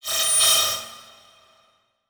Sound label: Title Transition Feedback Pulse; Production Element